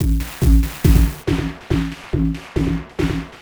Beat, Industrial

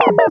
Ambi Down, Ambient; Zero-G Jungle Warfare 1, 2 & 3

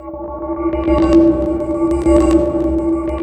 loop, 150 BPM, drum & bass

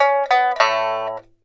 PIPA PHRASES; Spectrasonics; Heart of Asia

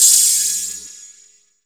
HH CLOSED, Disco House; USB Soundscan vol.20; Fresh Disco House I; AKAI

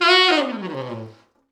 T Sax falls, Horns Sax

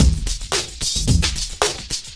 70s breakbeats loop; USB Soundscan vol.46; AKAI

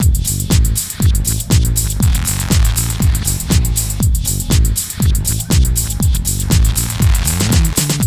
GHET ROL

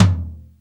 DRY TOM; TOMS; Back In Time Records; Big Bang

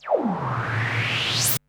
Virus Noise

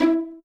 Violins pizzicato E3; Best Service ProSamples vol.51; Classic Orchestra 2

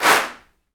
CLAPS, Africa Handclaps

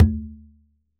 FIST, CONGAS; Q Up Arts; Denny Jaeger Private Collection

